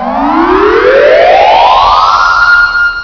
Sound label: ZING WOW; EFFECTS